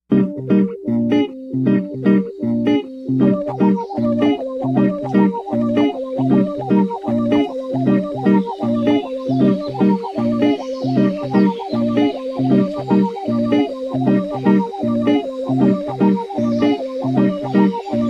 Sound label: groove